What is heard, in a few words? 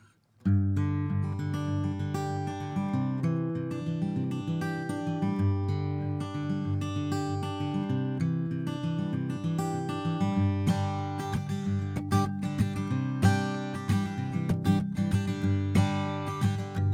guit; gemini